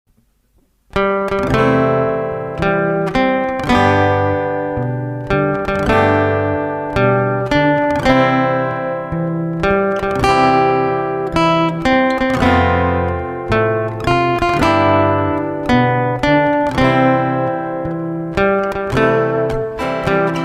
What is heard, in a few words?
Happy Birthday ringtone